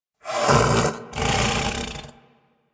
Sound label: Snow Critter Vocal Snore; Warframe; Hunting Critters